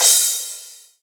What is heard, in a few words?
Crash, Drums Cymbal